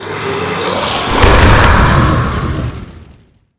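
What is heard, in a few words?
Dust Golem Buff; Seer; GamigoNA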